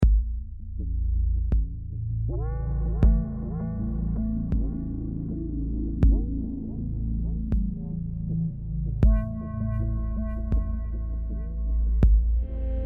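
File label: Am 80 guitar Volume Pedal Impro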